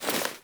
Foley Action Default Melee Swipe, Default Clothing; Characters; Player